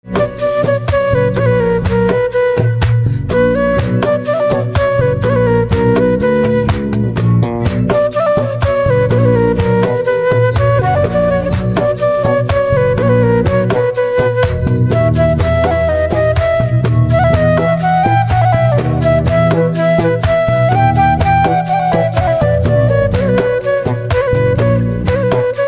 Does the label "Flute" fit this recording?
Yes